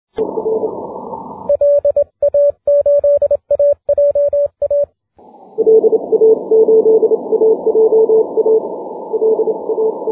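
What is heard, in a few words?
6m continuous wave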